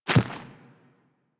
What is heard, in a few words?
Infested Small Walk Dirt, Footsteps; Warframe